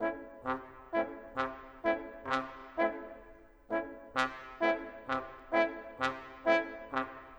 Horn Trombone, Orchestral; Sonic Foundry (Sony Creative Software); Orchestral VOL-2

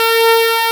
PPG 2.3; DIGITAL1 HYB

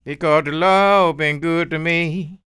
SING, Blues Soul; Spectrasonics; Vocal Planet

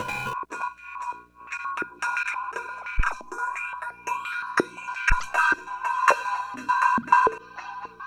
Warped Bell 119; M-Audio; Pro Sessions VOL-38; Abstract World Fusion 2

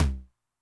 Noise Low, 909 LOW TOM

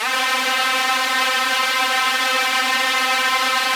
SPACE STRINGS, Yamaha VP-1; Music Store

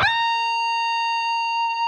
PRS lead

